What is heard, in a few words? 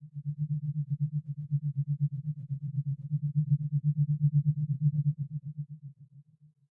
bouy pumping; Blind Drive